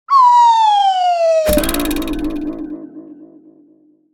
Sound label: Cartoon Falling; Meme Sound Effect